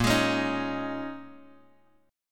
Bb Minor 11th, Bass Standard, Bbm11 chord; strummed; JGuitar

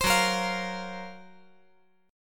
Gb Major 7th Flat 5th

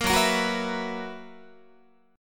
F sharp 11th F sharp Guitar; F#11; strummed

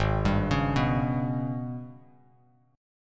Ab Minor Major 11th Ab, Bass Standard; arpeggiated; JGuitar

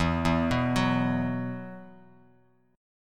E Suspended 2nd E, down, Bass Standard

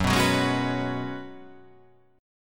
F sharp Suspended 2nd Suspended 4th; chordshape; down

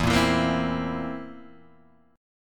A sharp Suspended 2nd Flat 5th

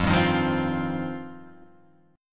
F 7th Suspended 2nd F down

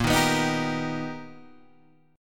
B Augmented Major 7th Bb, chord

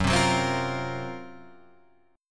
F sharp Major 7th Flat 5th

